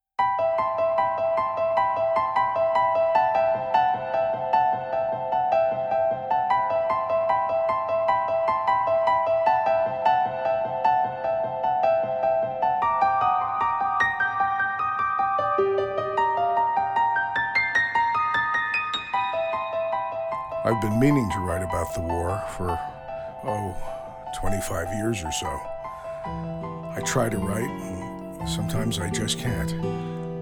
Waltz

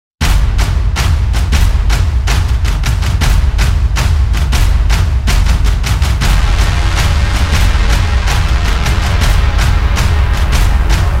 SuperNova Explosion

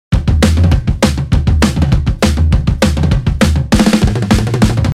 12 8 Loop

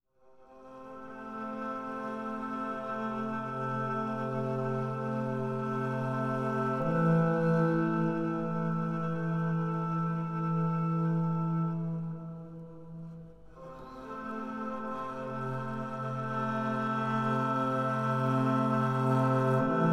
accords cellos

